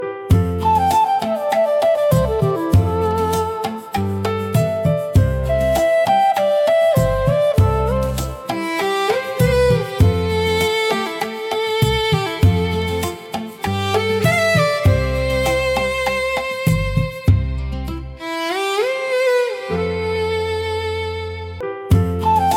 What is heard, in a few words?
indian melody ringtone